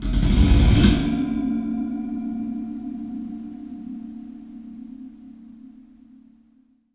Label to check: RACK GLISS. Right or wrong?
Right